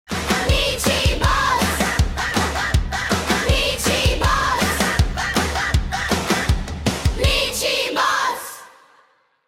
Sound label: jingle